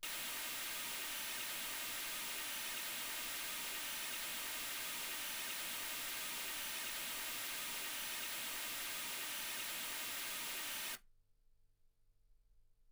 mls 48kHz

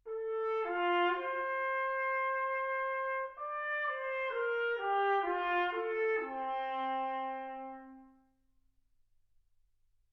trumpet

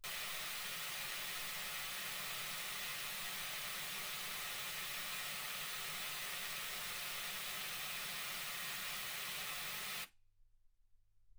wgn

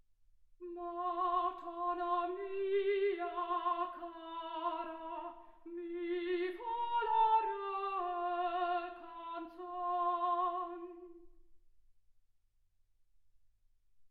soprano

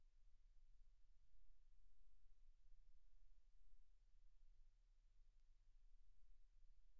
silence 48kHz

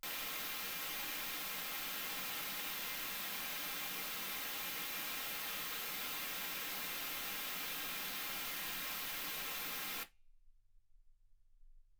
wgn 48kHz

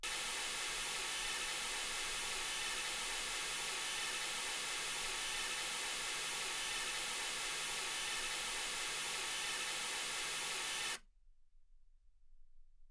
mls 48kHz

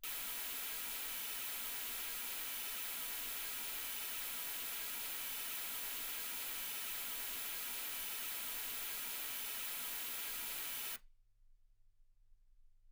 mls16 48kHz

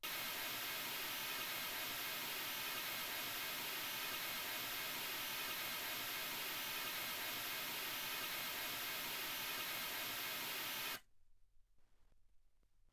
mls16 48kHz

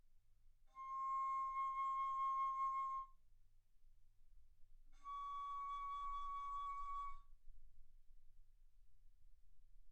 Bass Flute C6 Db6; ff

